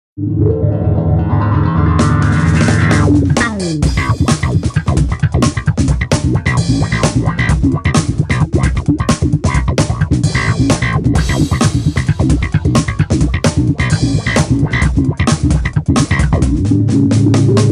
tone demo bass

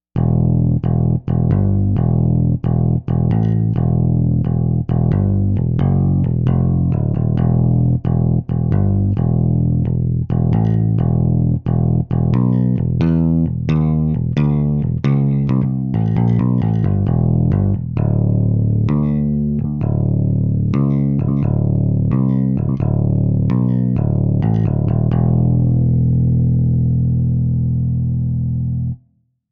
picked bass; Jupiter-80

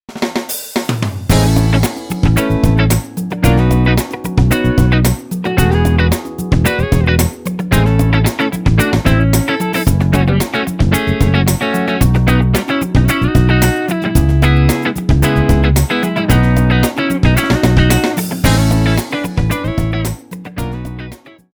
patch demo

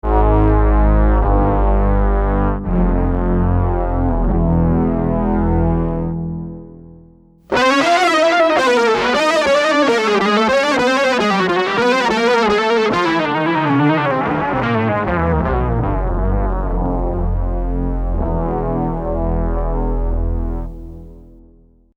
wave synth

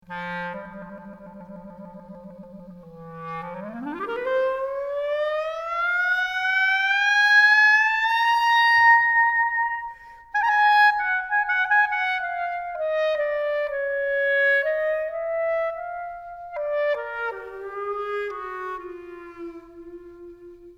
clarinet

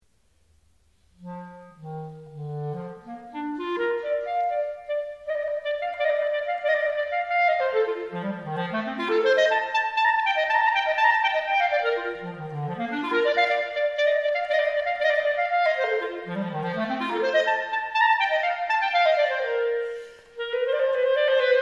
clarinet

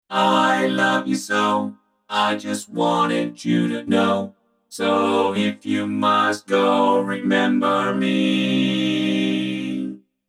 vp-7 background vocals

